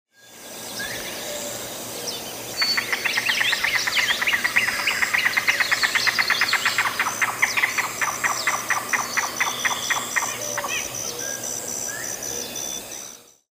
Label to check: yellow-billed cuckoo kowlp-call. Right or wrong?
Right